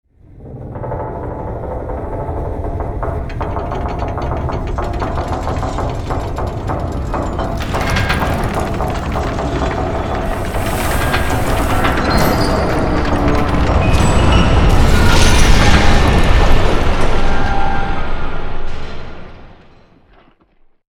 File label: diving bell seq video audio; Hollow Knight: Silksong